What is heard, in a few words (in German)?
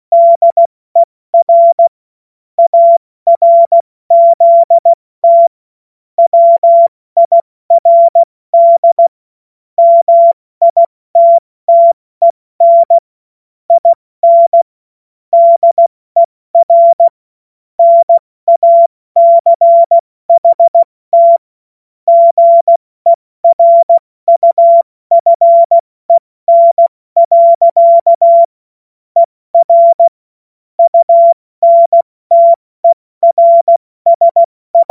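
Witz, Morsekurs, cw morsen; Witze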